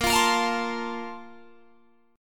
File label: A sharp Major 7th Suspended 2nd, Guitar, Drop D